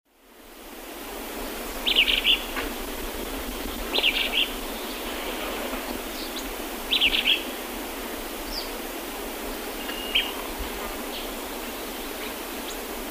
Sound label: Elaenia parvirostris, dawn song; S. America; Eastern Lowlands